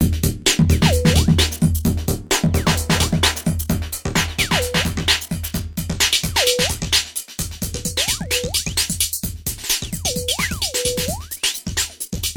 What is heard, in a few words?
drums